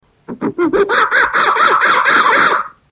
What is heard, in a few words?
monkey scream; made with Voicemod